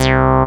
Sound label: TB303; Best Service Gigapack 1; SYNTB303